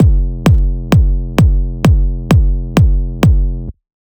Darker Kick

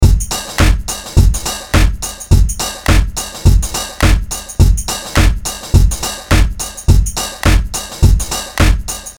pitched down

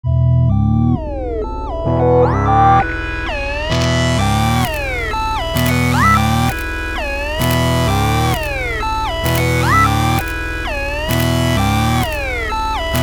complex modulation

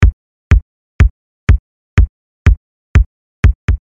Modern Chicago House

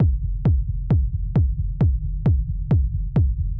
Dark Room Techno

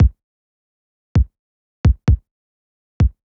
Kick

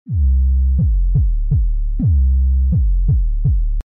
neve

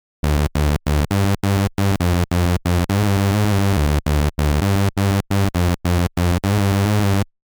Acid PWM Bass

